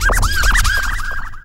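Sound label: scratch, record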